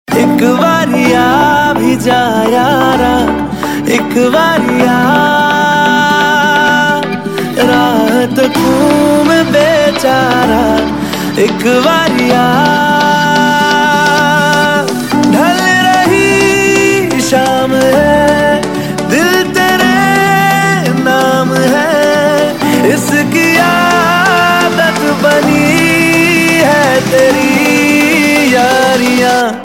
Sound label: Bollywood Song Ringtone